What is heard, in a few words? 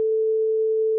tone